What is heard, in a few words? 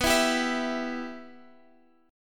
B Suspended 4th Sharp 5th B Guitar Open F; Bsus4#5; strummed; x,x,11,11,10,11